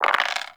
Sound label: Open Dice Movie; Cetus Vendors; Five Fates